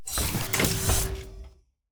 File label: Door Open; Opsive; UltimateCharacterController